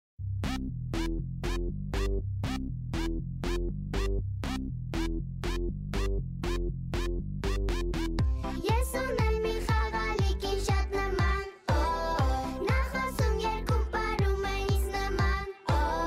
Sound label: Kids